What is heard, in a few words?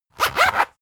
zipper long close rapid; objekte; reissverschluss